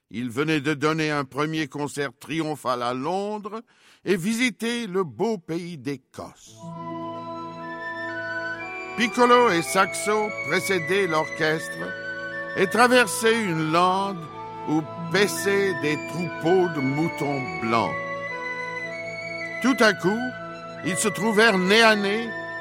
ecosse cornemuses; passeport piccolo

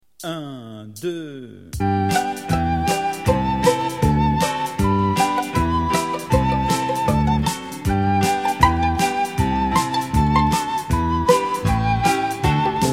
pizzicato polka

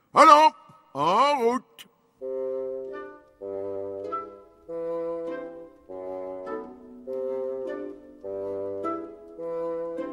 fin percu; piccolo saxo

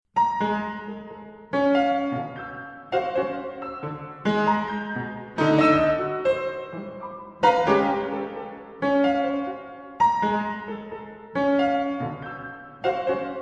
moderne webern variations pour piano

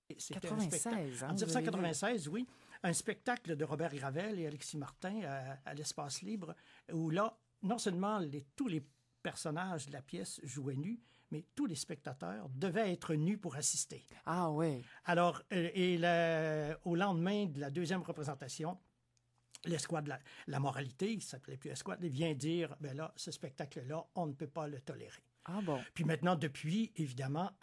danse africaine; censure; radio-canada

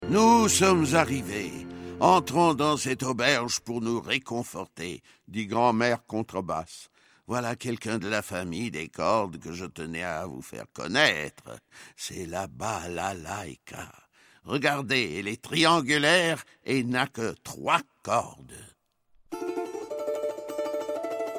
russie balalaika; passeport piccolo

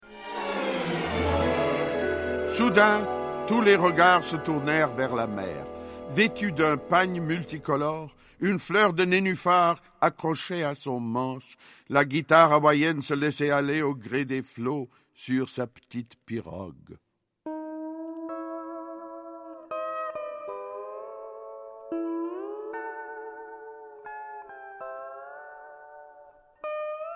guitare hawaienne; passeport piccolo

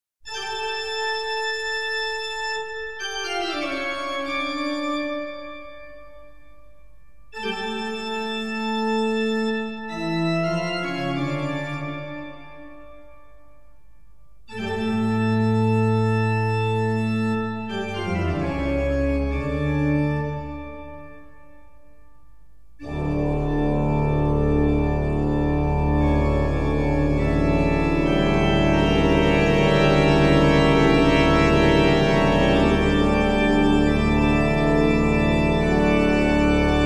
baroque bach toccata en re mineur

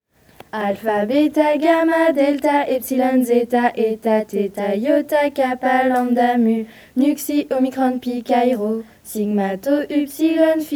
alphabet chant

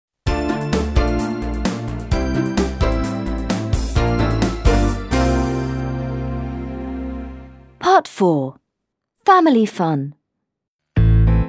audio eleve chanson

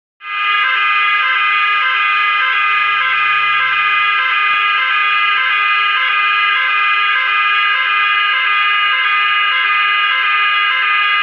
sonnerie incendie

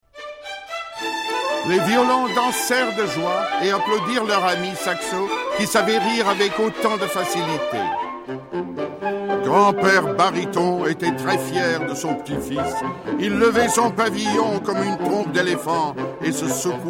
saxo canard; piccolo saxo

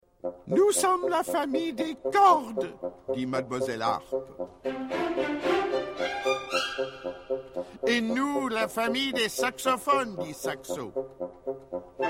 fin bois; piccolo saxo

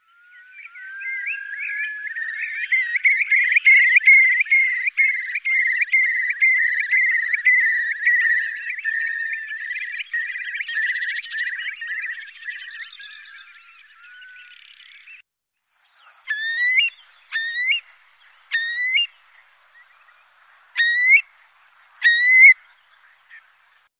Courlis cendre

